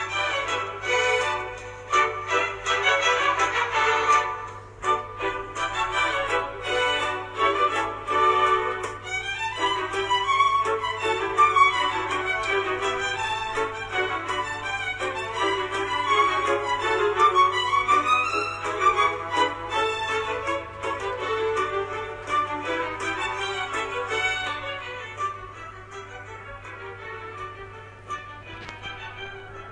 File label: sunny music